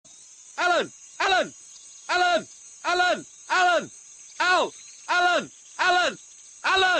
Is Calling Pop

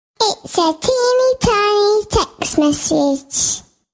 tiny text

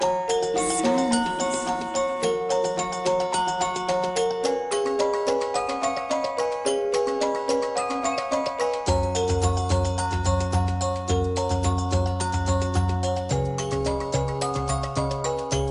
lullaby bells

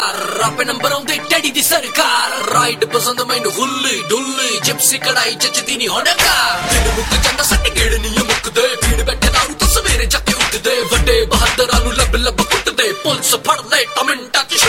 punjabi

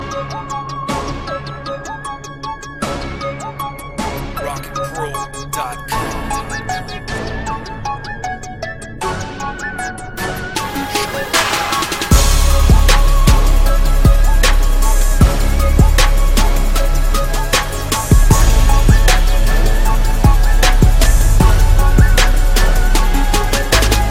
black beat, hip hop

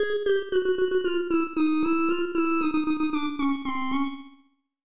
Carnival SMS

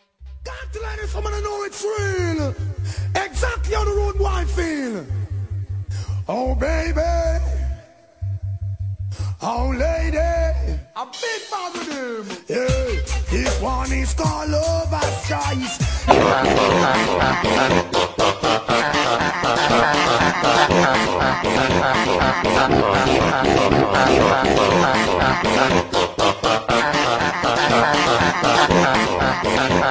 farting contest; mily guy

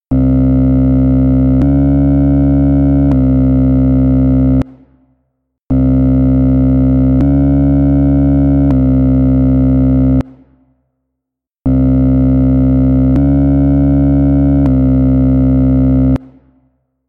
Shower Tub Grip

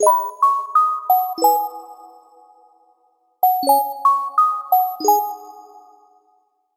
edm notification

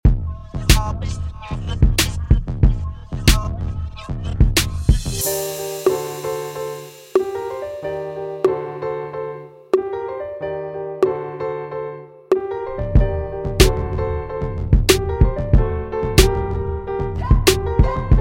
uptempo

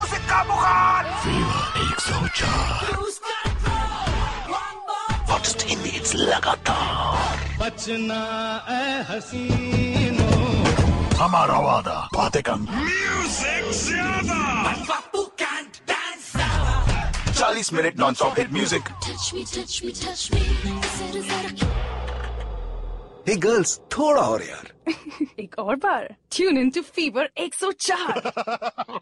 latin fever; latino

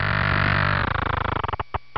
Close Coffin

